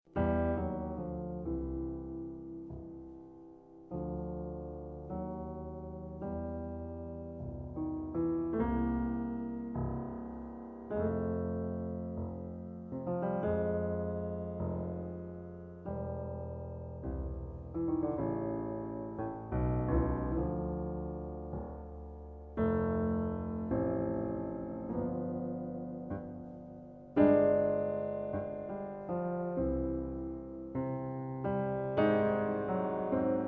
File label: Blues Choral Blues